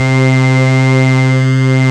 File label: C3, synth line; 90 s Sample CDs; NiceBeats; SynthLine VOL-3